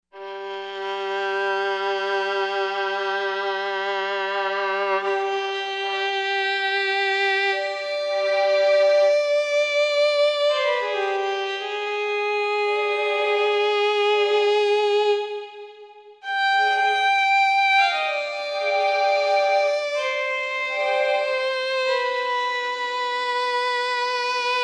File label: Broken Violin